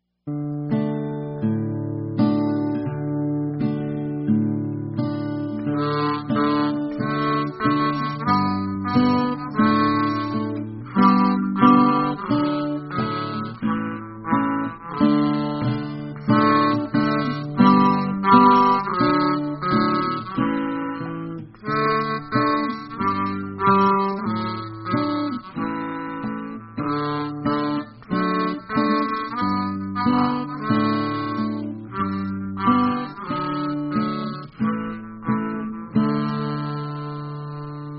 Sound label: guitar Pulmonica